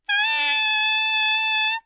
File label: B6 alt, saxophone, tenor